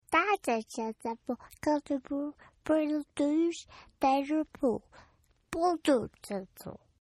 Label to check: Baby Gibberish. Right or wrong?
Right